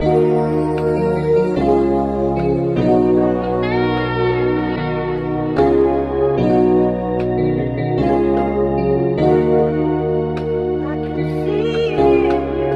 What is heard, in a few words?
keys voice i can feel it, Phonky loops